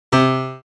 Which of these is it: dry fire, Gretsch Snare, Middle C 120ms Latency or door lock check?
Middle C 120ms Latency